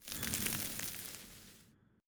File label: Object Grow Flora; LevelEditor